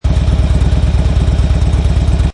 Motorcycle Harley Davidson Idle Loop Engine Perspective; Motorcycle, Motorbike motor loop